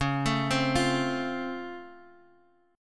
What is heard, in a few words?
C sharp Augmented Major 7th, C sharp, chord; down; chord shape